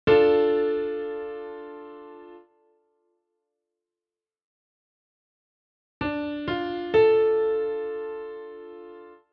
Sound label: akkord